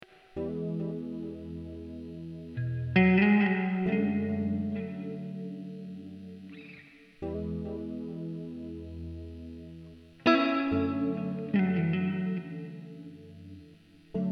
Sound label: slow